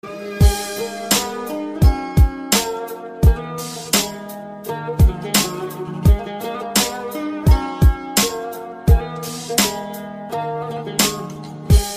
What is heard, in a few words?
Sad Turkish